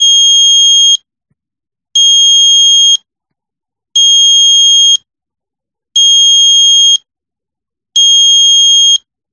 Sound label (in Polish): buzzer generator